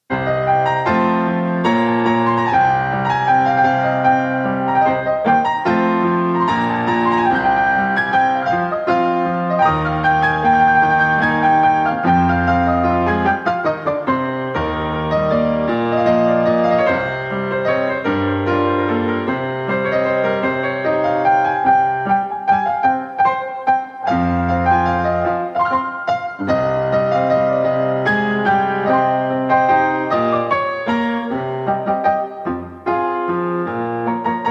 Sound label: Piano